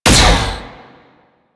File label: Shot, Weapons; Warframe; Corpus; UMP; Tetra